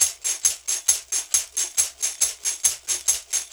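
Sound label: TAMB, tambourine; Drumdrops In Dub VOL-1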